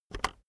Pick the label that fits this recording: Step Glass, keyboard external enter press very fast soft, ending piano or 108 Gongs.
keyboard external enter press very fast soft